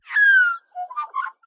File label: Magpie